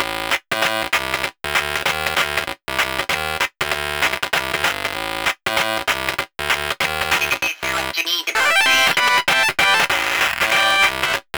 Proceed (Faulty Chiptune Edition); grapple dog

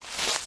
Footstep Grass; This Is the Zodiac Speaking; PS4

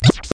respawn creature

